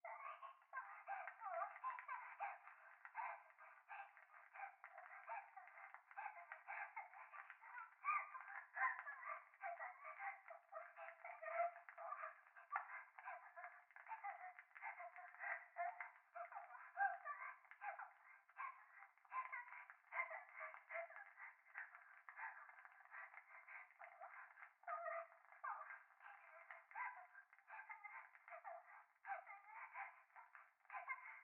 風呂 可愛い喘ぎ 暖炉

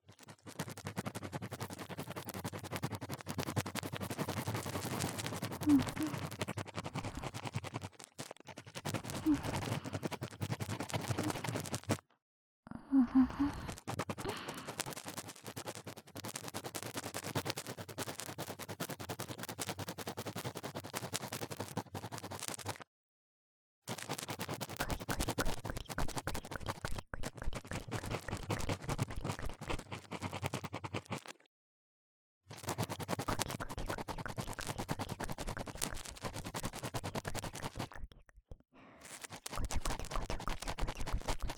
【左】浅め耳かき高速, ASMR

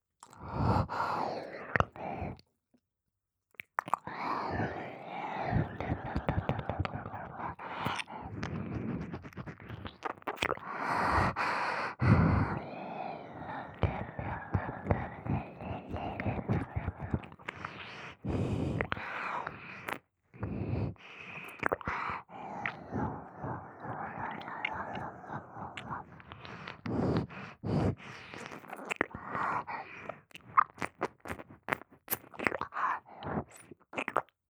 耳舐め 高速 吐息多め

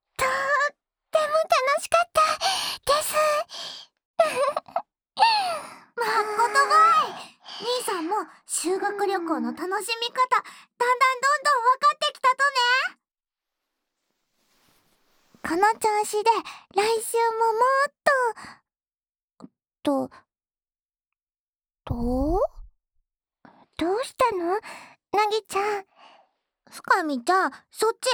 煤竹耳かきでの左右耳かき; コンクールのはじまり ～ 凪とふかみの煤竹耳かきでの左右耳かき～

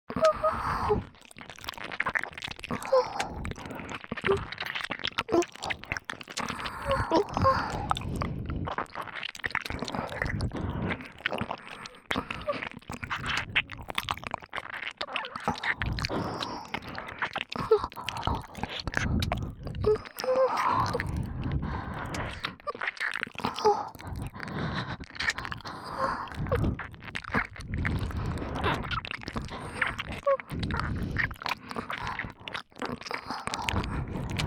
(両耳) ねっとりしゃぶりつき耳舐めエッチ, ASMR; 白耳; パンパン系効果音版

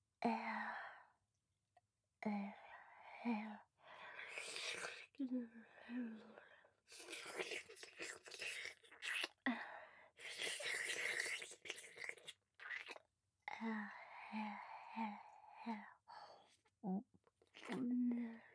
乳首舐め(レロレロ); 乳首舐めループ

